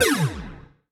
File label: Massive Laser Blast Laser